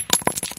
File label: grenade; tarkov